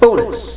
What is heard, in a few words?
Bonus; BeamWars 2.3.2; Arcade